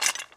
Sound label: autoplay Button Click; lost relics